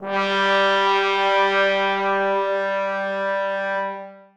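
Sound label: trombones sustain g2; Sonatina Symphonic Orchestra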